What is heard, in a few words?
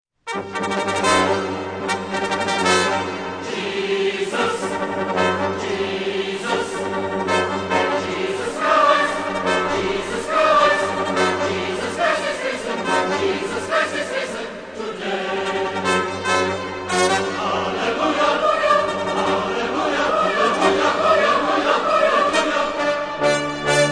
Easter Fanfare